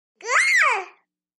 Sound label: Baby Girl Animal Sound, nineteen month old